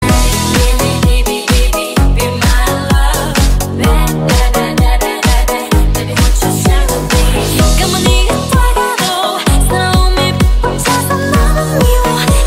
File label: remix